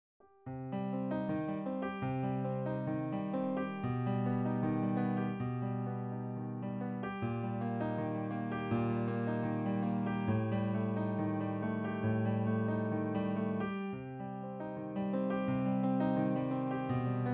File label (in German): nur piano